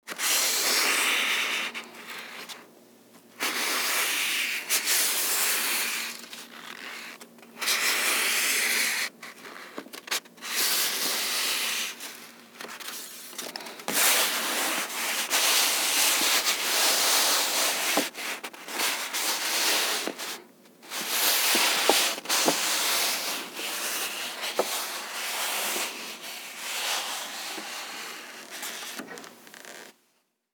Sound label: rubbing paper